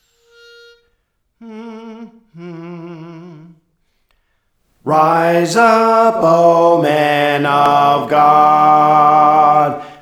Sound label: Alto; Praise For The Lord Training